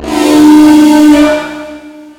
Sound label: alert